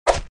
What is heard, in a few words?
Assassin Attack